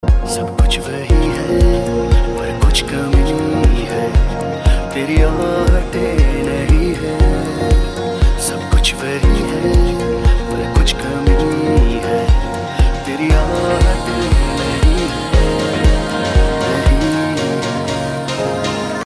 Remix; Bollywood; Ek Main Aur Ekk Tu

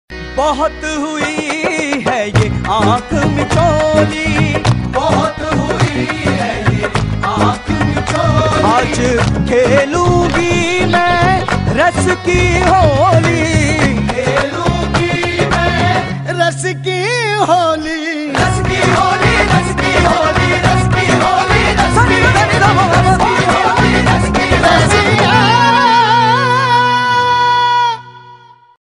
Ads, Ringtones; Slice

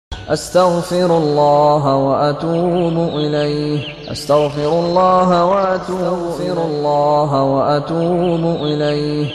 Islamic Sms, Ringtones

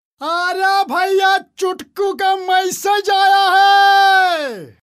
hindi sms; Funny; Ringtones

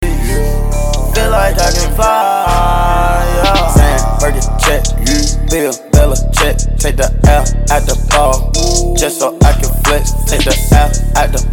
English Hip Hop

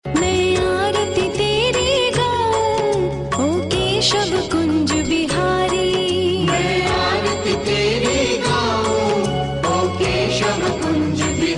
Janamasthami Bhajan Tune; Yeh Rishta Kya Kehlata Hai

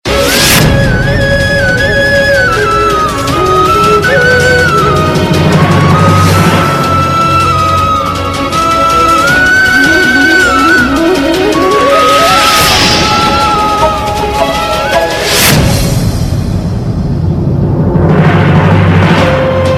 Dramatic Tune; TV Serial Tone; Colors TV; Shastri Sisters

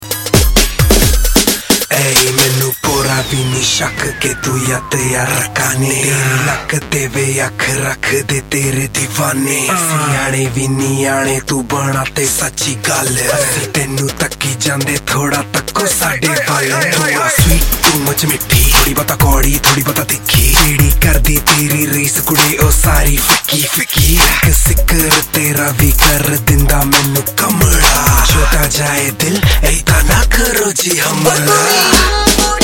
Rap Ringtone

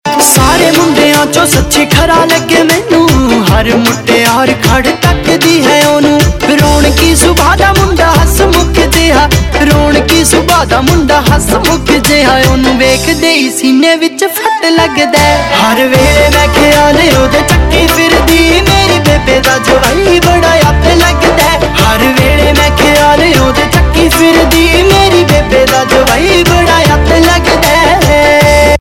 Ringtone, Punjabi

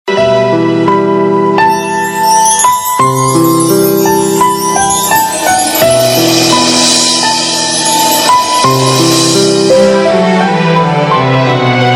Silent Tune; TV Serial Tone; Star One

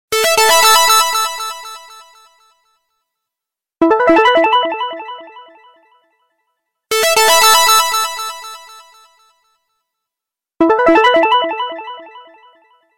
Received Mail

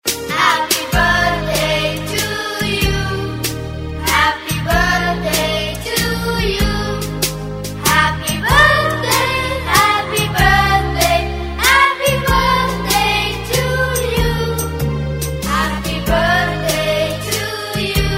Happy Birthday Tone - Children Voice

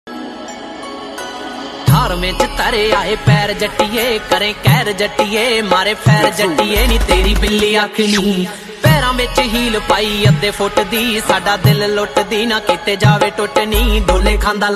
Punjabi Ringtone

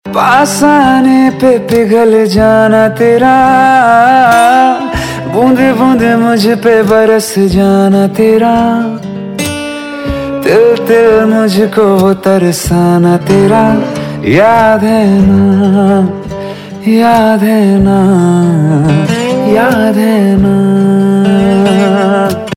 Unplugged Ringtone, Bollywood; Raaz Reboot

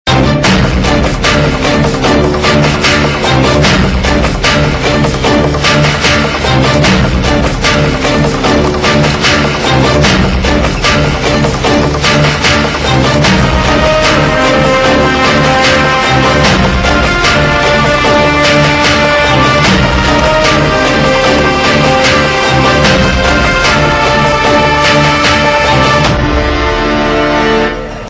Background Tune; TV Serial Tone; Imagine TV; Chandragupt Maurya

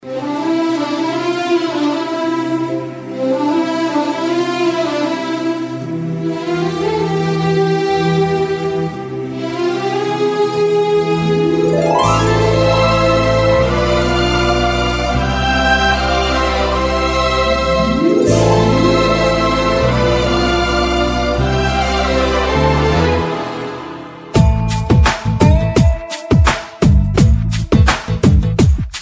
beat; Valentine Tones; Valentines Spl

